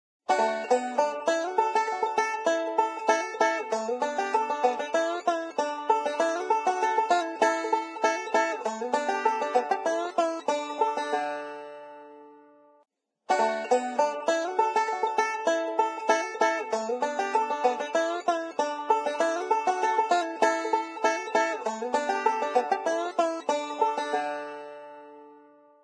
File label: Banjo; Zomboid; Lifestyle